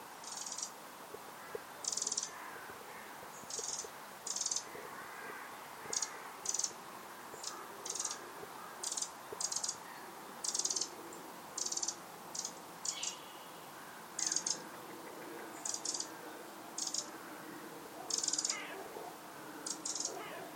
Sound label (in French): troglodyte mitraille